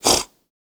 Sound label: snoring human; soundscrate